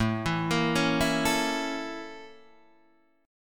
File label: Bb Minor Major 7th Flat 5th